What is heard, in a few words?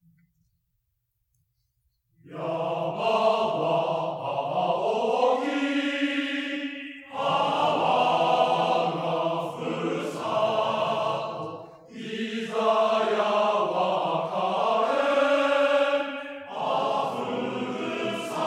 furusato chorus, mannerchor